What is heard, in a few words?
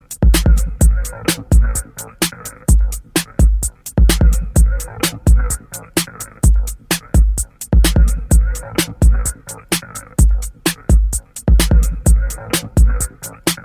Electro Voice